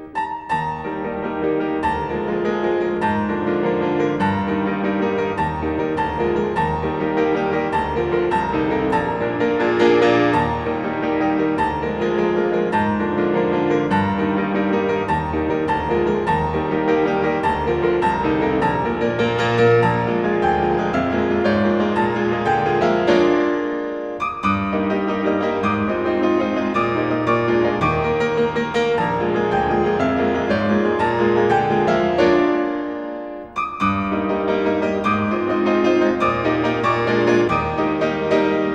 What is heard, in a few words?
Variations and fugue on a theme for piano in E flat major; Beethoven